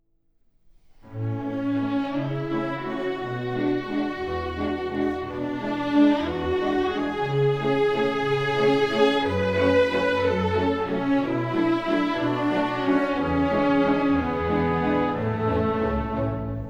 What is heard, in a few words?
Skaters Waltz